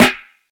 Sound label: snare drum sample b key, snare tuned to the B note. Loudest frequency: 1882Hz; snare drum samples